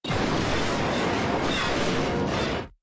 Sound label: all break sounds; vyond sfx